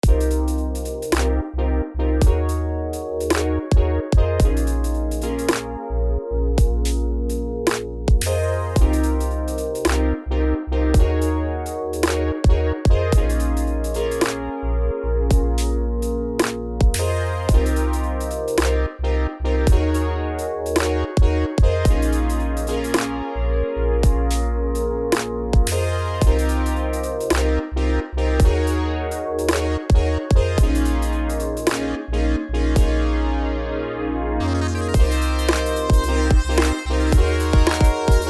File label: lounge